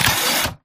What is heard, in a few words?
Mvmt Servo Head pivot Short; AI Stalker; Titanfall 2